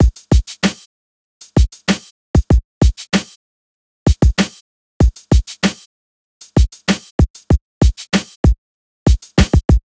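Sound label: Drums, Hip Hop Exotica, 096 A minor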